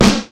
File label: boom snare sound c key, Royality free snare sample tuned to the C note